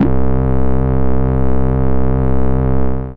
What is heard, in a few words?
Single Electric Basses, Dance Floor Bass